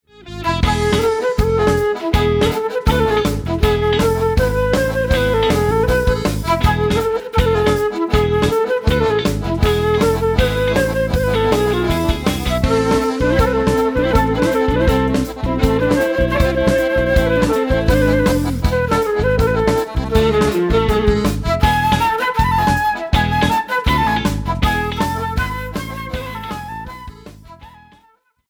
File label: Flute